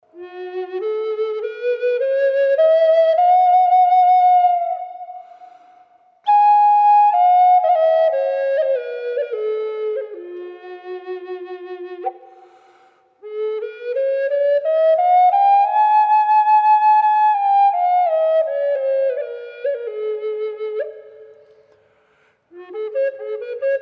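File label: coyote f sharp minor reverb, flutes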